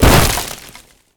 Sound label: Nature Spell Vines Tree Impact